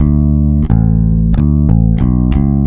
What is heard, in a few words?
COUNTRY